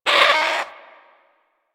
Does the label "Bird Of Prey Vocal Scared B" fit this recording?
Yes